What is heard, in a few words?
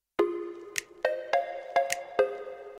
Drums, notifications; LEAGOO M9